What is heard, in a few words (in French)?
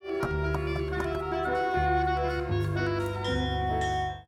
hautbois